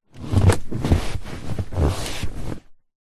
SUV movement in leather seat internal, Nissan Patrol; vehicles